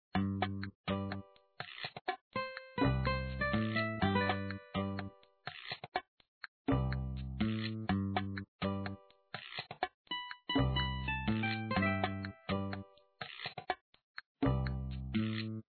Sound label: build screen music; papa s freezeria gamerip